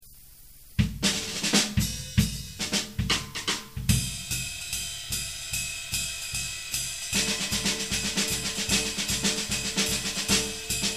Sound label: 3 against 4 jazz